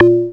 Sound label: A#, Synclav FM PERCUSSION; Big Fish Audio